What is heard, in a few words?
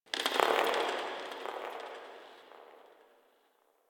Covered Vine Area Creak; Ambience; Misc Outdoor; DE; Warframe; Infested Eris